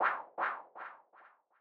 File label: cable net; cardboard kaiju; sound design